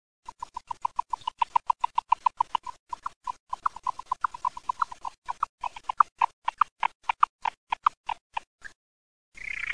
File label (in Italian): FRULLINO